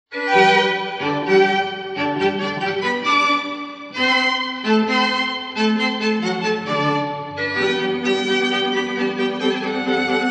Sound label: violin